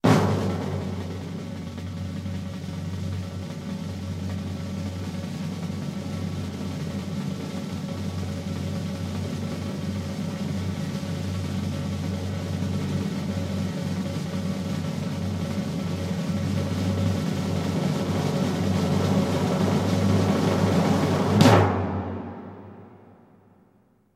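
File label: snare Roll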